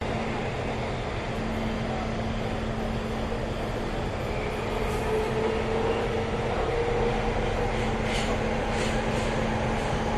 Metro Ride; Budapest Hungary

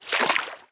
fantasy Footstep Water; little robot sound factory

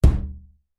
impact metal thump front washing machine

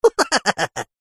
male laugh cartoon like

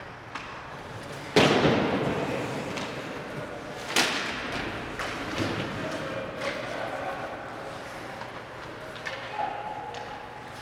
ICE Ambience skating rink indoor

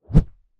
Just Gore Swoosh Swish

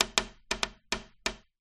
foley metal tap knock window; zapsplat

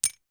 foley broken dish piece down on other; zapsplat

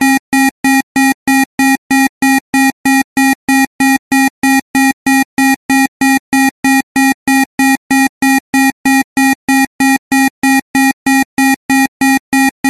science fiction alarm siren beeps mid low fast

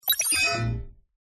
Beeps; PMSFX

